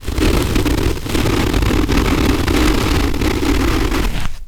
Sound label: Scrape Chalkboard